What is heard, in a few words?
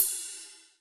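Cym Flange Jazz, Alesis D4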